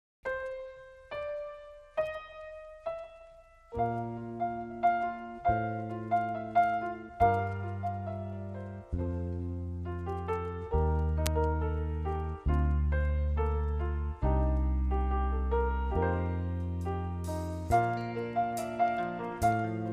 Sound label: No Flute & Strings